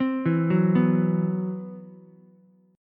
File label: C Major 7th Flat 5th, Ukulele, chord; CM7b5 arpeggiated; JGuitar